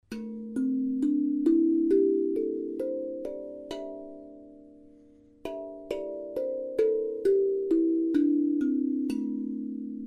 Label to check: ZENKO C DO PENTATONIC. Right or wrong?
Right